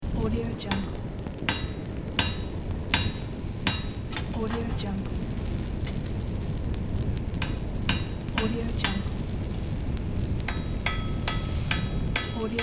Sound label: blacksmith workshop ambience loop